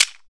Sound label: PRC WOLOF, Afro Shakers; Roland LCDP14 Africa VOL-2